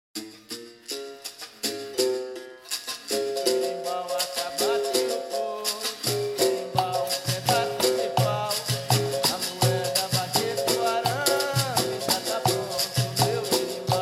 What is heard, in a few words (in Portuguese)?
meu berimbau; chants